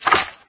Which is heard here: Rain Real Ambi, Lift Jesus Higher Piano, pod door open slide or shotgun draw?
shotgun draw